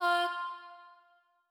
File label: Wet One Shot - F, Vocal; Tonal One Shots; Cymatics; Euphoria Vocal Sample Pack